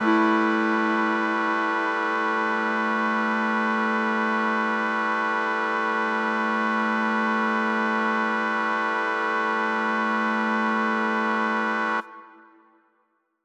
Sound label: Mixed Winds A7:9, synth orchestra